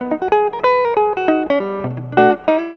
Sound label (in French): Guitare jazz; Ecole de musique